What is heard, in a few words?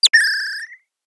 birdroid, ringtones; standard ringtones